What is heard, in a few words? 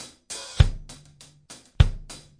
100 bpm Reggae 16ths, drum loops and breaks; 091 to 100 bpm